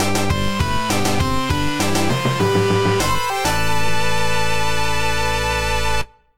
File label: Stage Clear